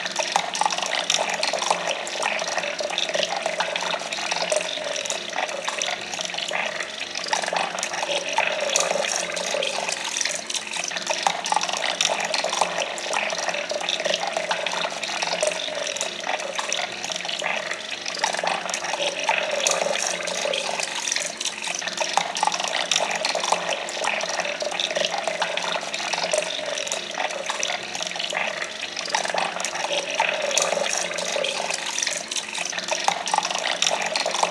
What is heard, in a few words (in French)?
Ambiance Eau slow 80